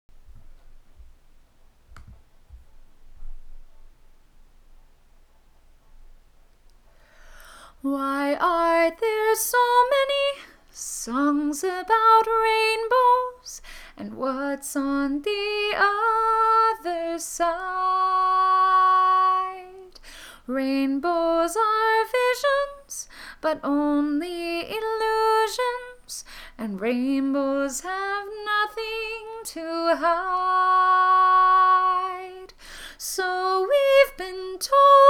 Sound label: vocals